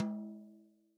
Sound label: TOM, Real Drum Kit, POP KIT; REAL DRUMS; Best Service ProSamples vol.43